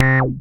Anasound Short hi C, Industrial Analog Single Notes; Sonic Foundry (Sony Creative Software)